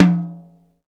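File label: tom high, Roland; Club-50 - Foundations Roland; Ext. Snare 4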